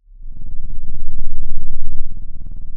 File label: pop